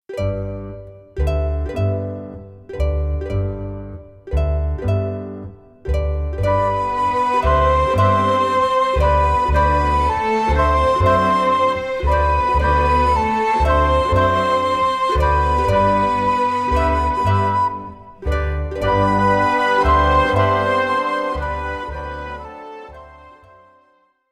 Barcarolle

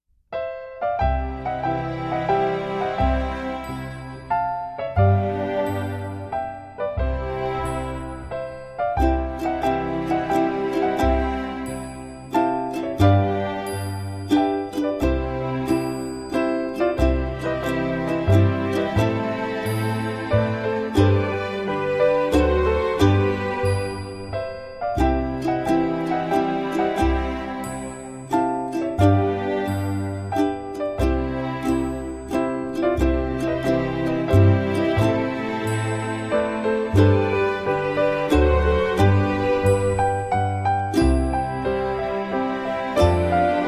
Beethovens Ukulele Minuet; Classical Ukuleles A Collection of Favorites for Young Players

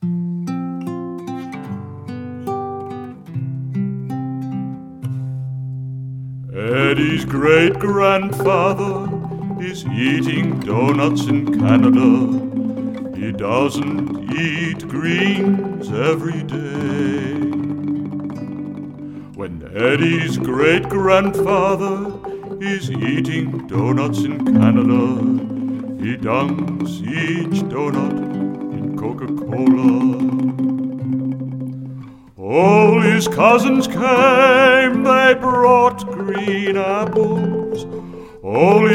Vocal

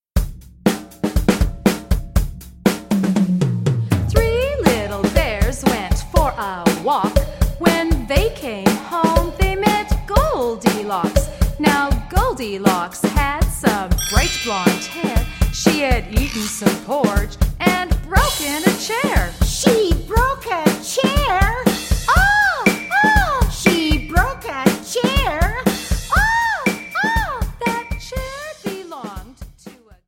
Rap

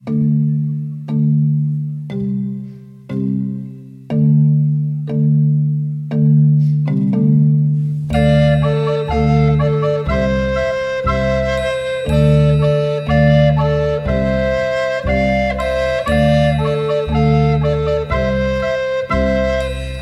Alto for a Walko

